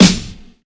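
perfect acoustic snare sample a key, tuned to the A note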